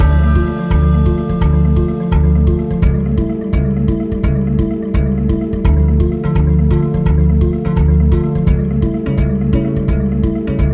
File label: Boss Music; primal light linux macos switch windows xbox one xbox series xs gamerip 2020